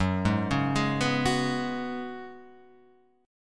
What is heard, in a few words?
F sharp Suspended 2nd Suspended 4th